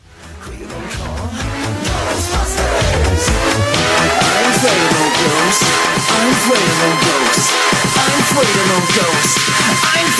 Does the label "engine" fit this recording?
No